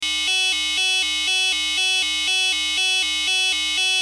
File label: alert sound